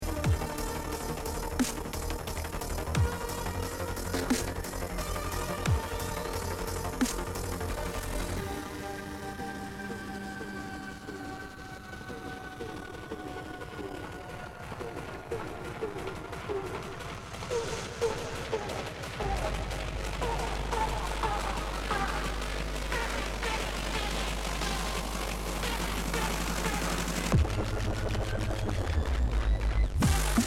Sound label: BASS WEEDY DRUM